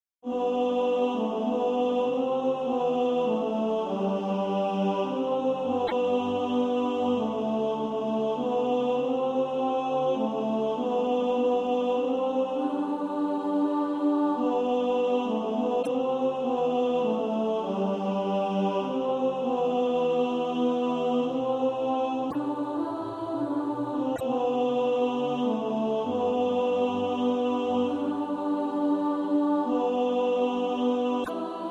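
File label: tenor, Practice Music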